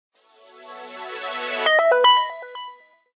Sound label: Power On, phonetones Other tones; GD910